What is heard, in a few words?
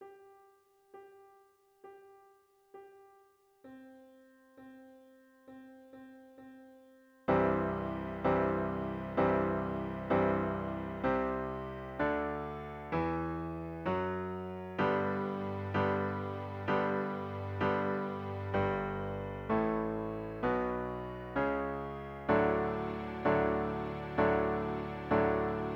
Open String Tunes